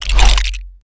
multibrick whoosh; build mode

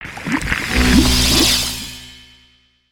Geyser; ambience spaceship; Maelstrom

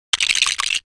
smash; creatures organic

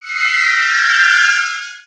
Spider Boss Fire Rain Whistle

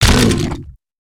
on hit, creatures organic

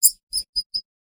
3D Storage Room rat; LU; ninjago; ambience ninjago

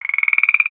Frog Hatchling Croak; creatures organic

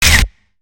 Robot Move Arm Down; creatures mechanical; Lego

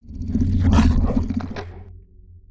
idle, creatures organic

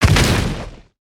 Footstep; Burno; aronwk collection; ndaudio; creatures organic

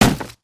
Generic On Hit With Effect; creatures organic

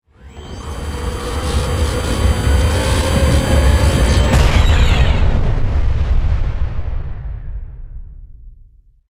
Hologram Explode; Venture; ambience nexus tower

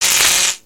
spark; ambience spaceship

